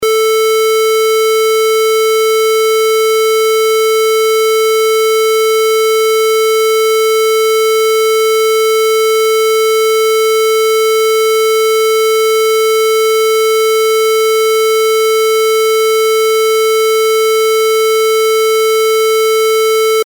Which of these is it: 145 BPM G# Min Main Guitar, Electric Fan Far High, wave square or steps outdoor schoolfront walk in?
wave square